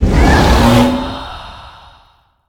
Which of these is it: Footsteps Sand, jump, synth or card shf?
jump